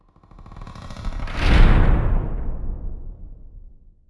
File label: Mourn Gates Close; The Elder Scrolls III - Morrowind; Morrowind GOTY GOG